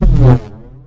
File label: zwoop ringmod; Weird and Interesting Hits; single hits